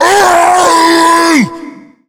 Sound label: tank fire, voice pain